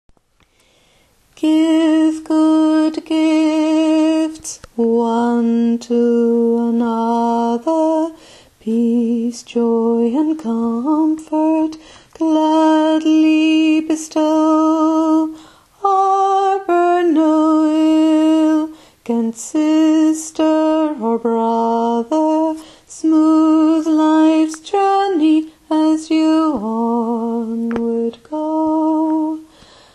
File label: bass voix